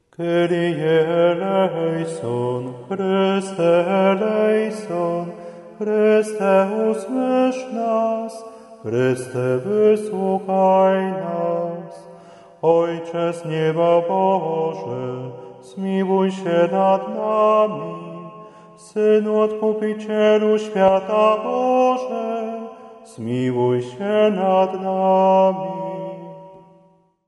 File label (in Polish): Litania do NMP Maryjna